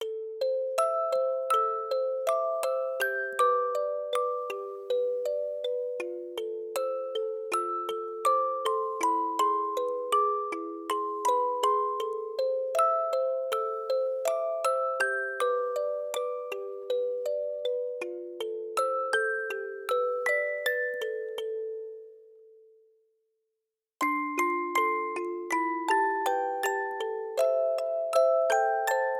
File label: Music Box